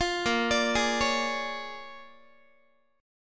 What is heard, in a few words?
A sharp 7th Sharp 9th A sharp down Guitar; A#7#9; arpeggiated; 20,18,17,18,17,x